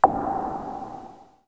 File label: Snare, Korg Z1